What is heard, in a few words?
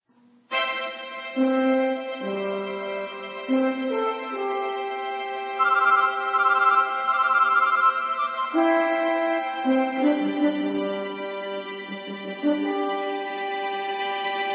music